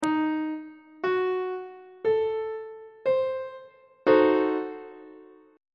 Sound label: c diminished 7th 1st inversion on treble clef